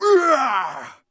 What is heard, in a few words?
fall, monster; thiefboss1